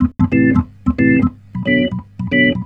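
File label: reggae organ A; Best Service Advanced Media Trax Modern Composer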